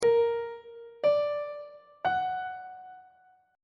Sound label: a sharp augmented triad chord intervals; on treble clef